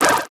Multimedia Internet CD-Rom Flash Hi-Tech

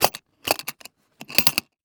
Industry Tool Tackle Box Lock Movement

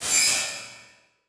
Transition Metallic Blast

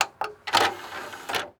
Technology Electronic DVD Player Open

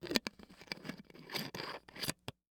Household Bottle Close Water Bottle

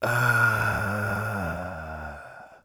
Human Male Moaning

